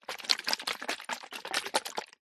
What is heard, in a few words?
foley liquid in plastic disc container shake